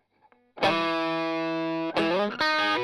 Strum Line

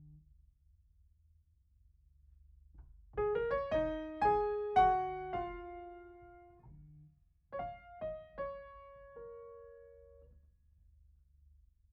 Piano Mono

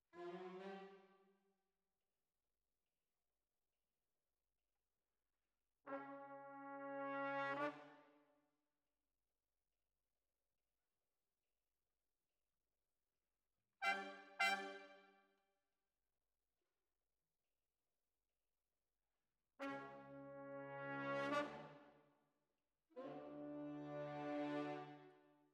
Trumpet

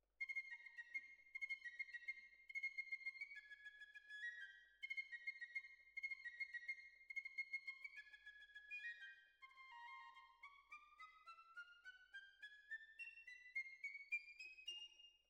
Violas Over

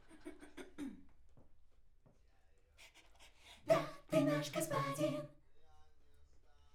Backs AKG LEFT

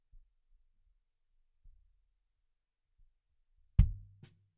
BD In

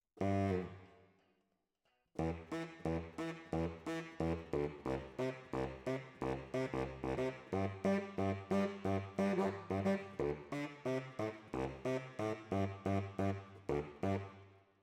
Tenor, RNR for piano

